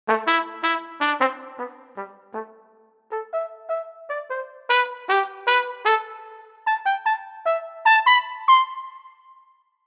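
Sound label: TROMBA staccato, trumpet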